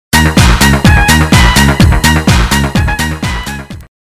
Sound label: dj music